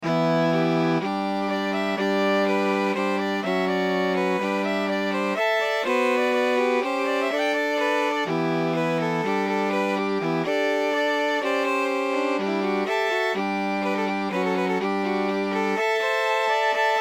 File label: Viola